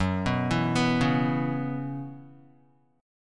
Gb 7th Suspended 4th Gb down Guitar, chord; Gb7sus4; arpeggiated; JGuitar

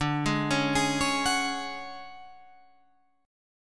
D Major 7th Flat 5th D down Guitar Drop D

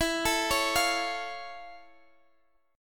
F Augmented Major 7th E

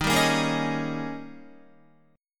Eb 11th Eb, down, Guitar, 4F,4A,5C,5G,5C,6E; strummed; JGuitar

